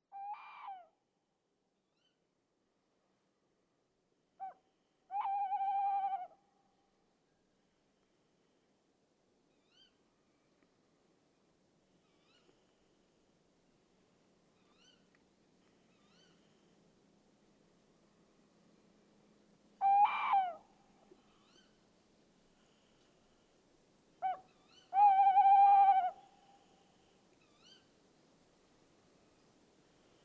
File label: STRIX ALUCO, eurasian tawny wood owl; Birds